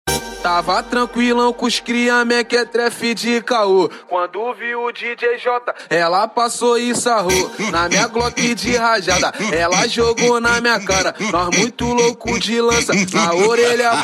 funk grave

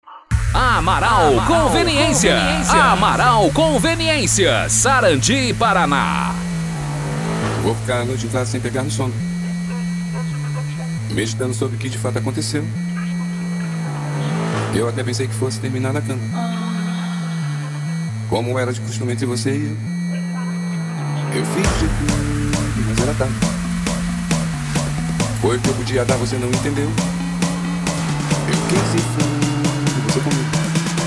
funk e sertanejo; amaral conveniencia; sarandi pr

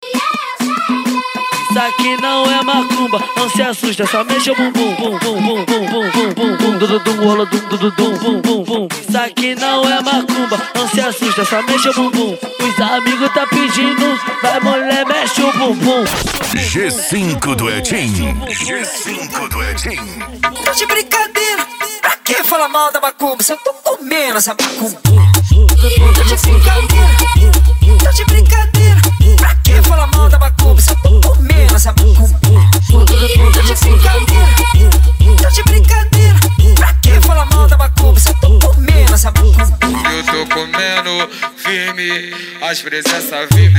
mega funk e funk mega bass

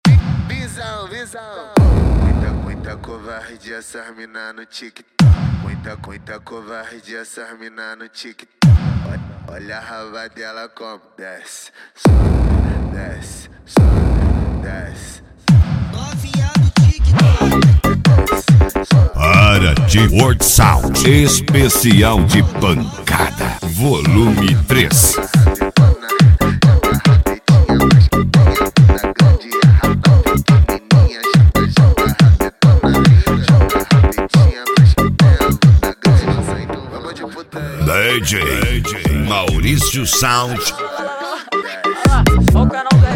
esp pancada

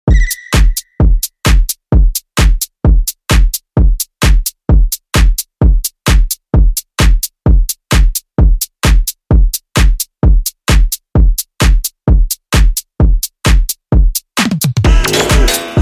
eletrofunk; som automotivo

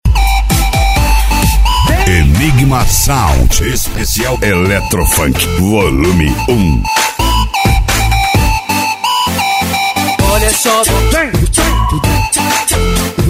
especial eletro funk

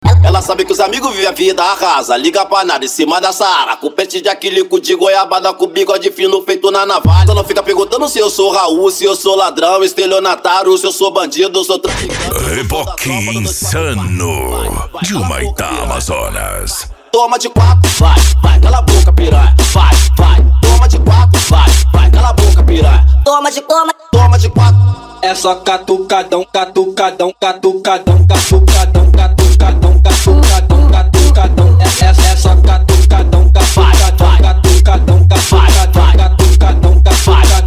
noia dance e funk mega bass

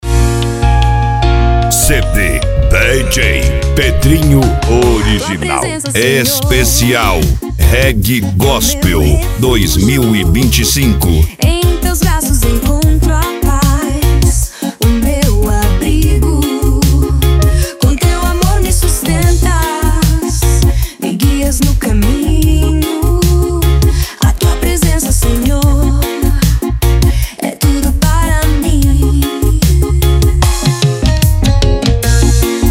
cd reggae gospel

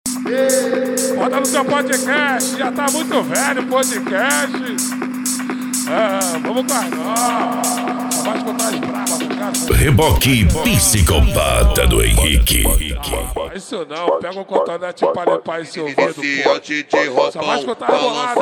eletro funk

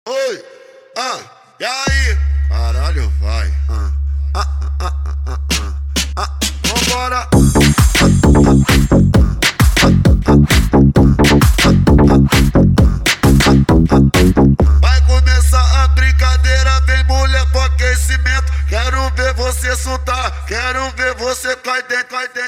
mega funk; cd corsa pancadao

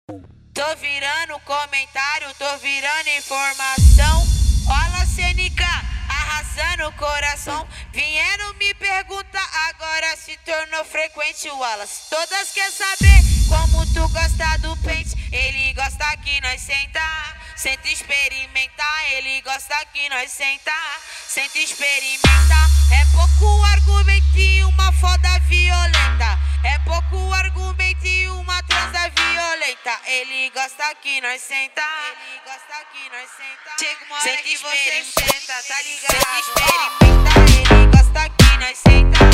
mega funk; corsa pancadao do dordete

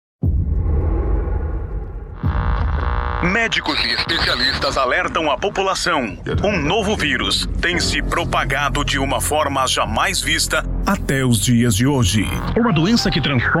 abertura funk; funk verao v2

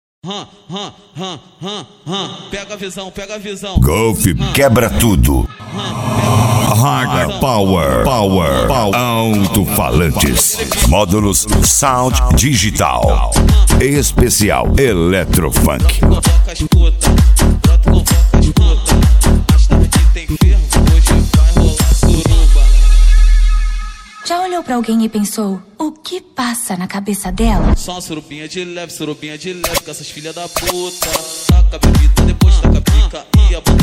eletrofunk

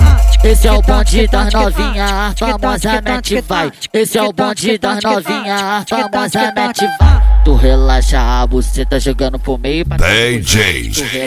funk sertanejo

